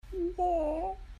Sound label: woah